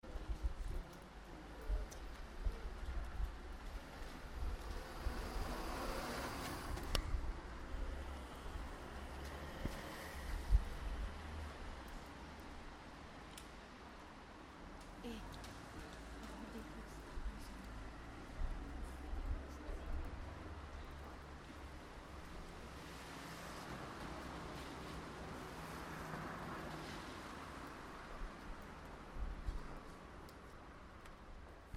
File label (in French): ambiance sonore Louis Blanc